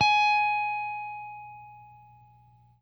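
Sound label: jazz line, guitar; Quantum Leap - Guitar & Bass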